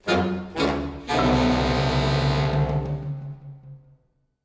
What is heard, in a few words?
Death Stinger; Sly Cooper Thieves in Time PS Vita PS3 2013